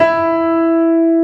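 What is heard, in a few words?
pluck E4, piano; AKAI S-Series CD-ROM Sound Library VOL-2; PF MIX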